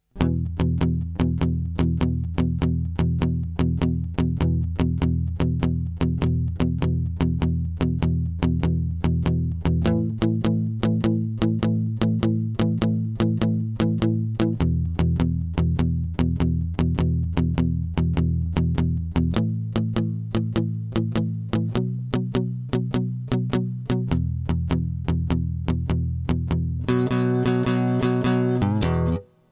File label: blues prog